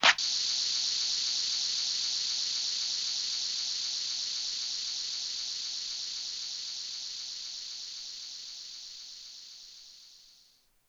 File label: Bobomb Fuse; New Super Mario Bros. Wii